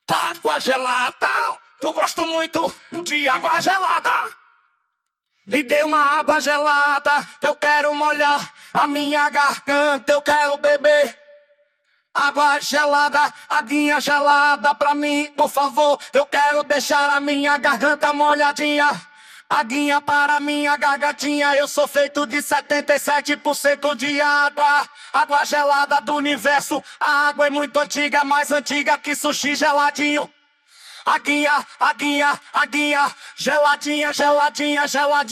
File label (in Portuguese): Versão Piseiro Vocals